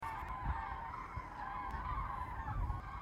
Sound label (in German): kraniche